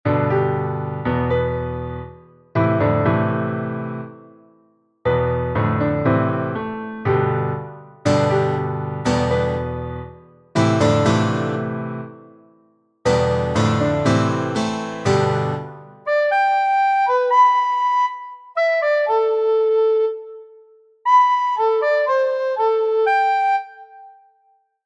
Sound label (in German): koordinate in a moll